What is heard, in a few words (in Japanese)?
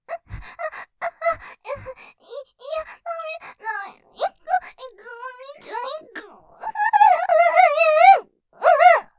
絶頂スパート 気持ちよすぎて 絶頂; ループで楽しむ！シェフの気まぐれ抜き抜きコース